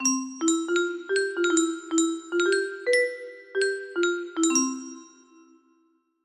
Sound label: music box melody